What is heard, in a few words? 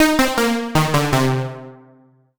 MUSIC EFFECT Solo Warm Synth Negative (stereo); startide macos switch windows gamerip 2017